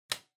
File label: Light Switch Rocker, Dry Mid